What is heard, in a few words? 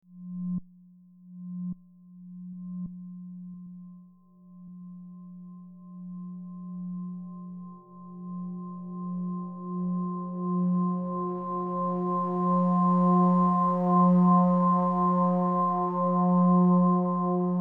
fm8 wet; native instruments